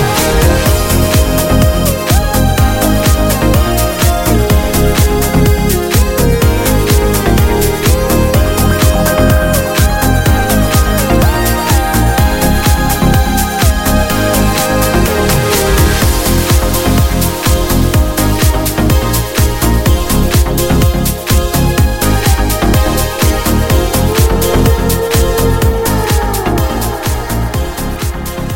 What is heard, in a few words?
Frozen Trance